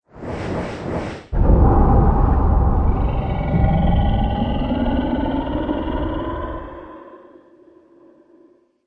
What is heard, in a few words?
Dragon Lands Then Roars, monster